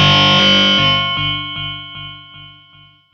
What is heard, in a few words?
distorto bell; misc; Sonic Foundry (Sony Creative Software); Ugly Remnants VOL-2